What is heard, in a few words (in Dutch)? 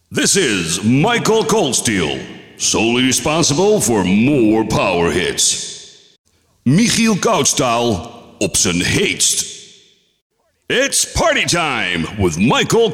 Originele jingles